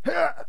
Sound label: Pain, soldier; hellenes